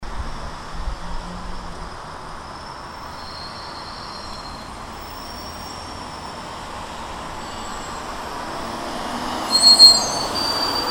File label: traffic with bus and cars